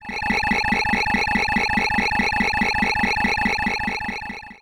Science Fiction Sci-Fi Electronic Computer Readout Long